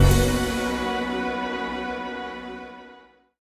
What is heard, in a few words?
Race Stop; Summer Catchers